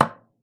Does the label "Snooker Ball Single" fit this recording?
Yes